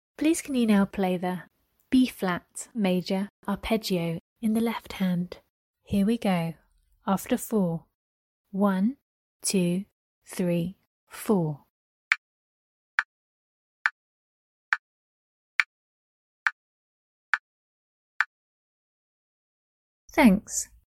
b flat maj arp lh

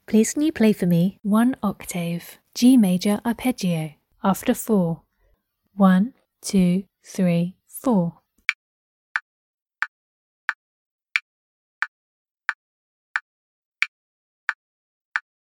g maj arp

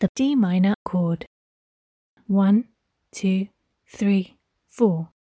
D minor chord

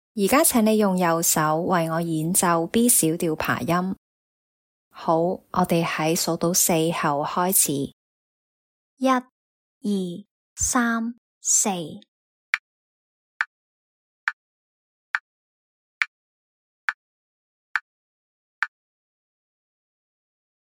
b min arp rh

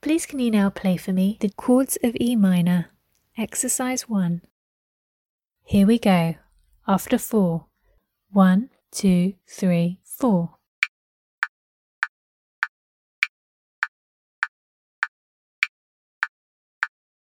chords of e minor

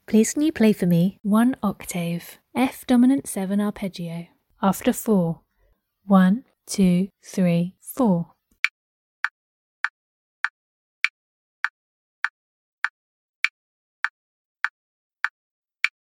f dom 7 arp

